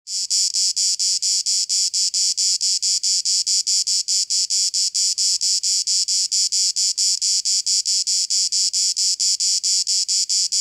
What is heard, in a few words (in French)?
Cigale